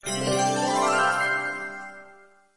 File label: game over; freecell solitaire